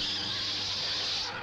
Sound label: Roar; Megahertz